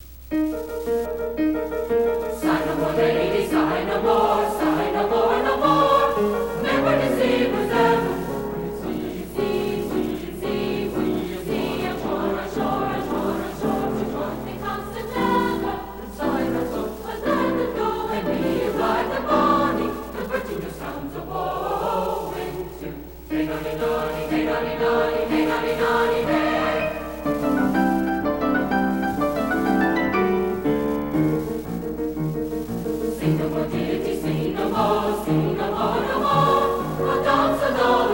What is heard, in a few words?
Three Madrigals, chorus